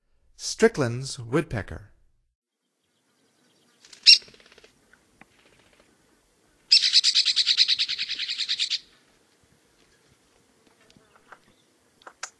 Stricklands Woodpecker; North America; Stokes

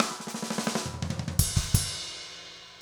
jungle 170 fill

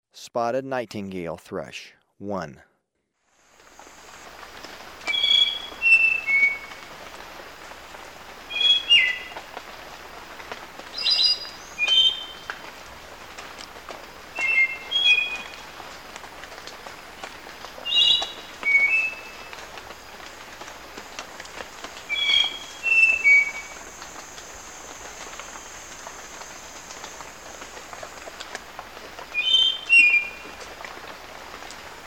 Spotted Nightingale-Thrush, Birds of Ecuador; S. America

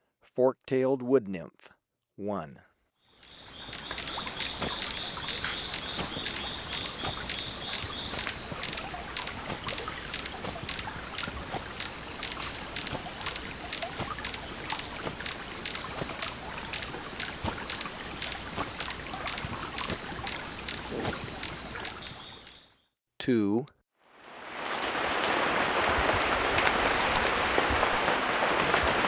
Fork-tailed Woodnymph, Birds of Ecuador; S. America

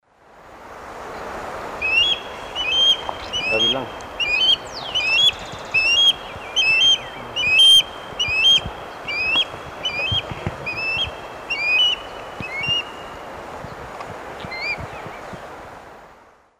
Pandion haliaetus, flight call; S. America; Eastern Lowlands

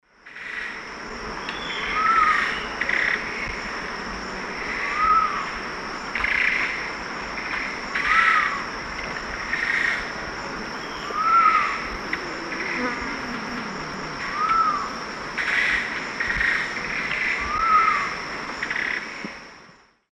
Trogon viridis, calls; S. America; Eastern Lowlands

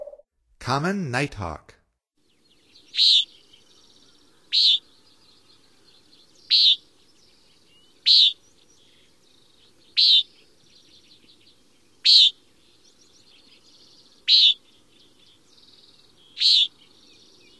Common Nighthawk, Songs of Birds of North America; Stokes

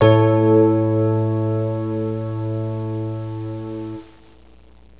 PNO RHOD; MUSIC; INSTRMNT

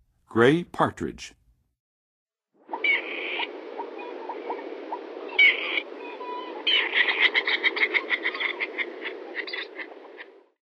Gray Partridge, Birds of North America; Western USA; Western USA Songbirds

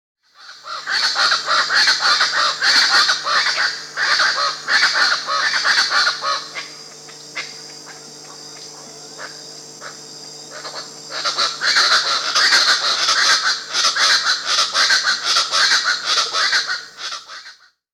Speckled Chachalaca (Ortalis guttata), Peru; S. America

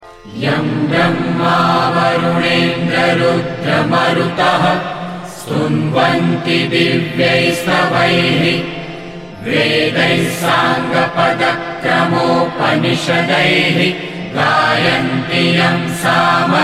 Prakirna mantra, shloka, Himalayan chants

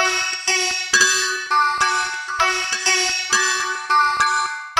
Picky Strings; Electronica

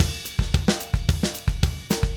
Drums, Jazz

